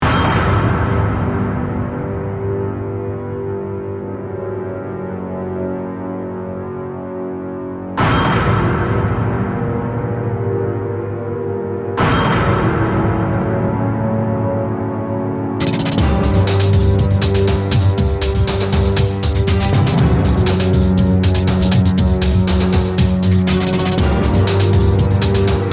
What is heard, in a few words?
Composition Beat, Production, Element, Composition, Beat, Dark, Thriller; BlastWave